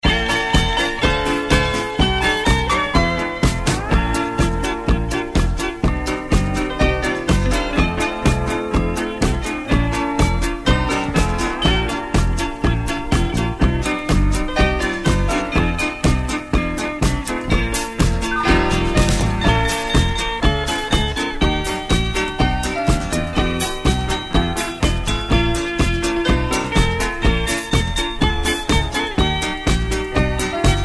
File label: music